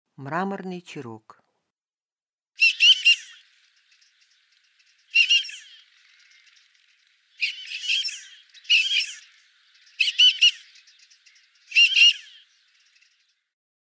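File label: mramornyy chirok golos, marbled teal voice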